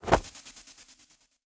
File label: Break Cloth